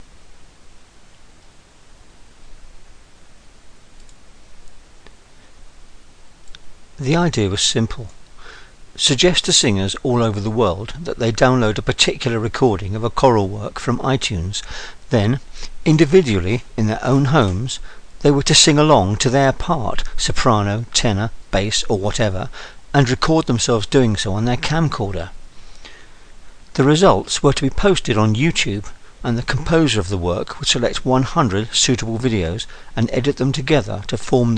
virtual choir

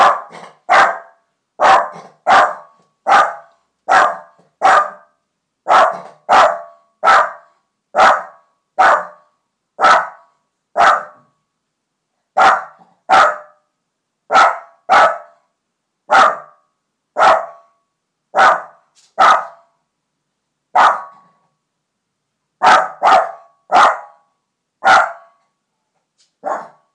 bark

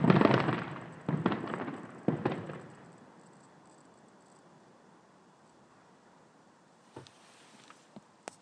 large and noisy fireworks at marina; abingdon

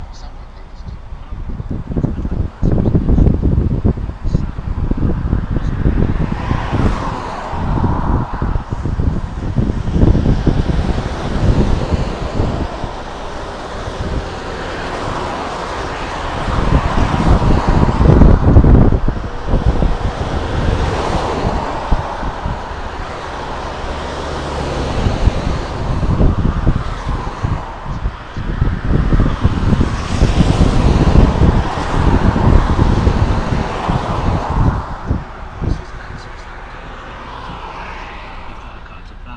sound of the m69 as i sit in a traffic jam

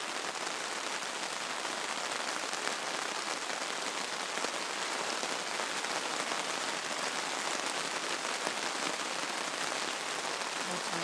the sound of an irish summer in a tent

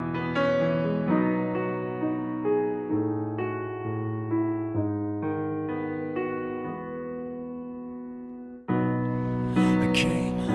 burp

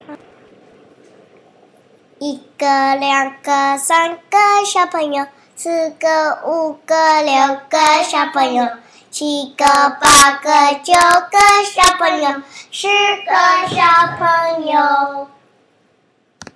chinese counting song

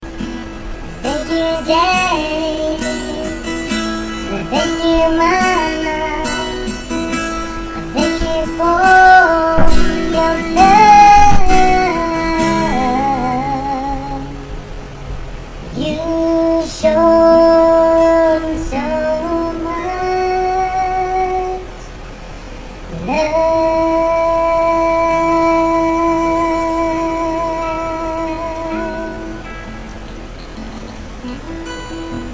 mom dad chorus

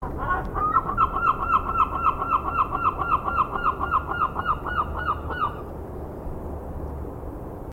seagull in Chickerell

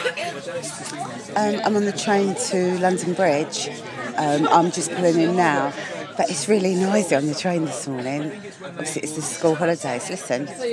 noisy train

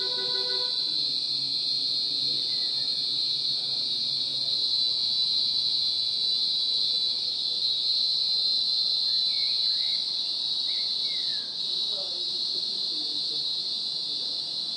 cicadas

